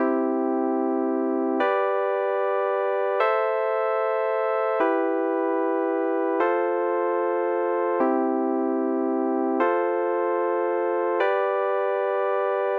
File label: chord